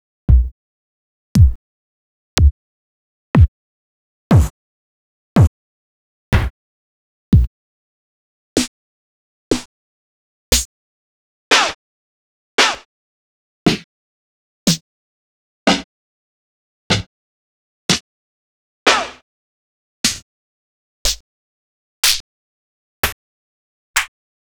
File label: Vinyl BD SD; drums&percs (chains); X-Static Goldmine